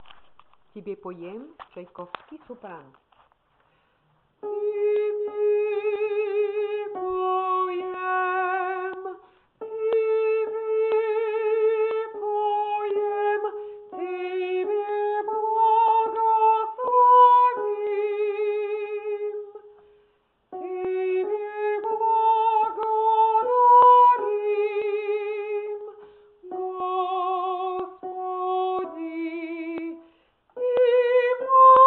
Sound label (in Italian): soprani; tebe poem